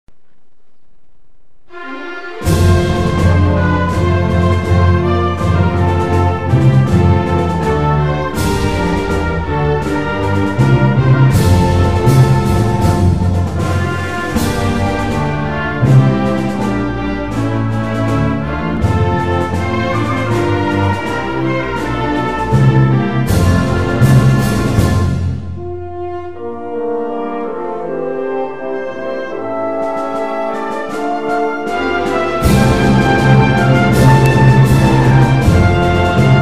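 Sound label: anthem of the Maldives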